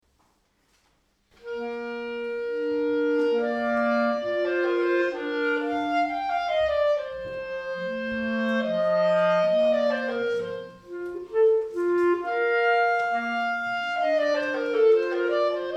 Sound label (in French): duo clarinette